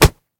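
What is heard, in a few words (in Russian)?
не сильный удар кулаком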